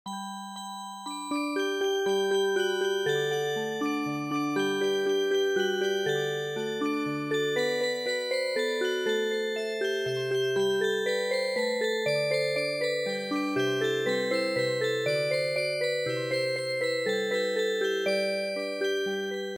Music Box; go on a spree in shabby house

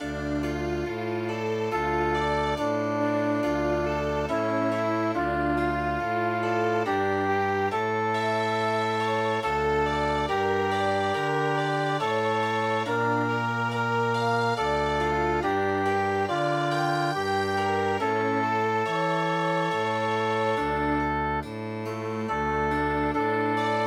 Chamber Music

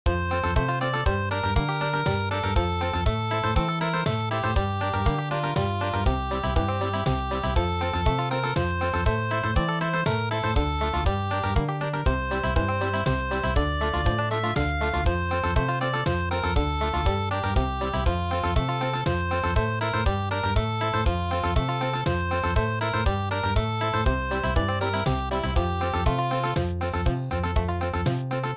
Country, midi

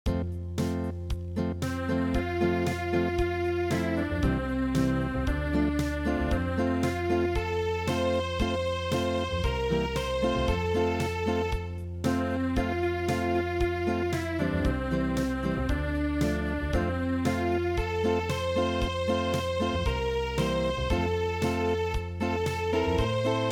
Unplugged

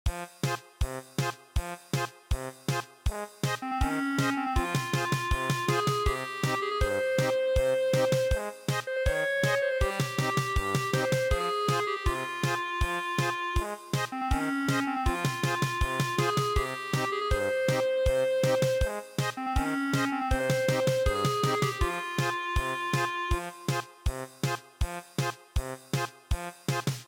German Polka

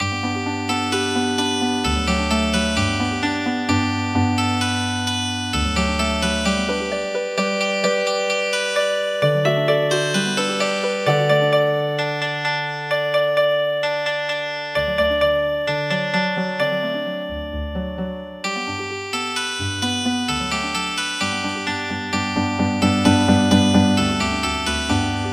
Healin Harp

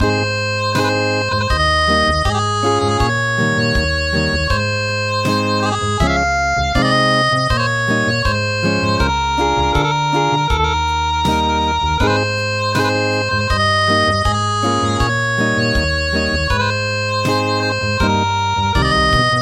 Acoustic

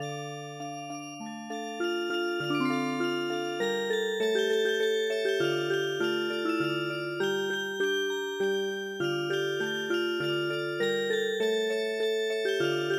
Music Box

